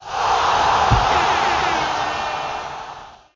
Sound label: Football - Match win Sfx; My Talking Tom